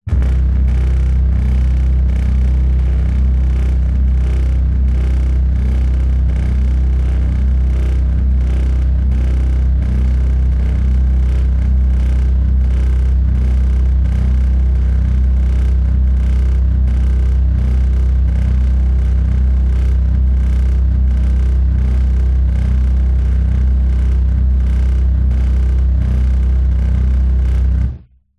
vehicle underwater or space resonation; lukas tvrdon

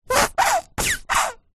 household shampoo bottle squeeze empty

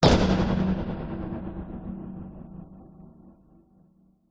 sound design impact metallic slam droning tail; zapsplat

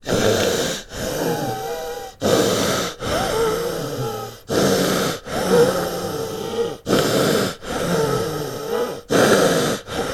human breathing with bad mucus chest flu or cold

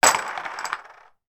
connect 4 playing discs drop into box

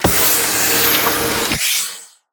Air Lock Door Close; Blastwave FX

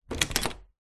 household door internal wood open

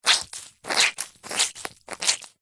household wet cloth squeezes

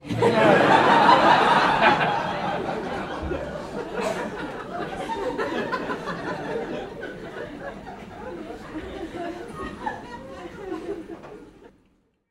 human audience laughter comedy club x200 people

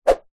cane whip swish through air

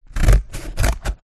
office scissors cut card

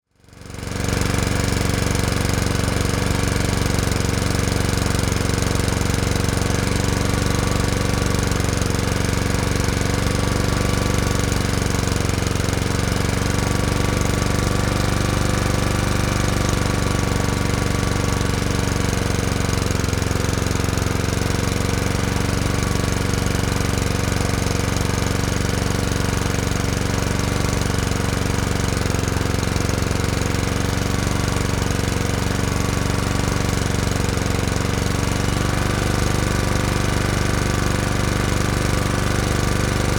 Lawnmower Idle; noise creations

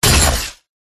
impact hit hard broken glass short slam; zapsplat

